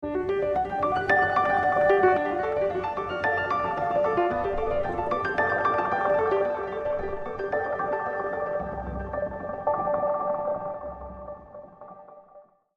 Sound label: Piano Arp wet